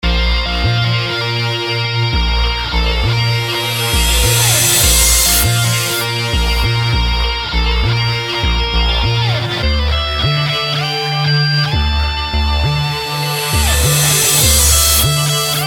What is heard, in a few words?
rock pop